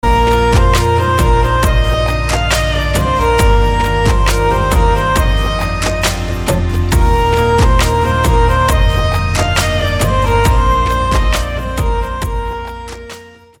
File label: Instumental Ringrtone